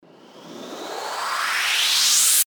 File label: RISER